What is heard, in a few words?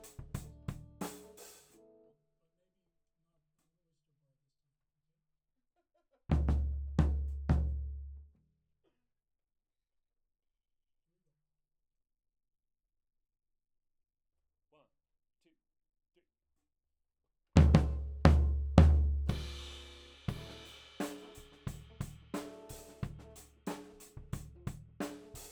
Floor